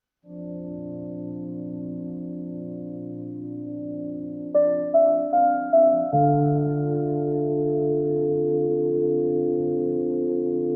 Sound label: focus lofi